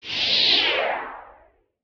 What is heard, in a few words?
Motion Design Woosh; SoundsCrate